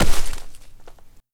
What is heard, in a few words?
slide floor